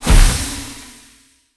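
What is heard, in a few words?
magic explosion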